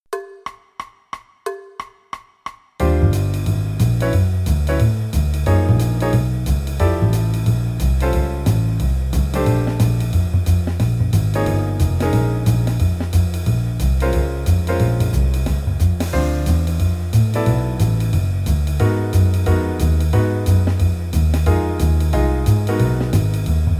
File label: Play-along, qn=180; Jazz Piano